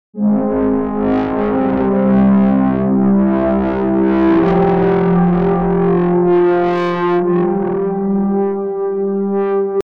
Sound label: raspy resonant